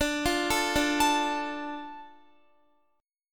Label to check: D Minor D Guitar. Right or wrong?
Right